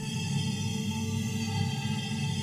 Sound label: debuff; smite; khepri